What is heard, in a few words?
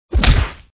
punch